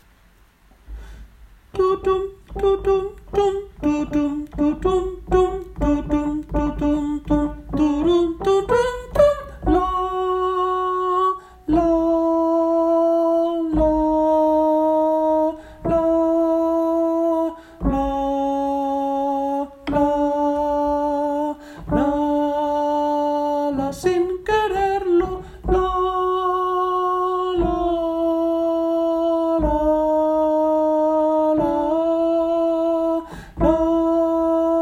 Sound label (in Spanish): Alto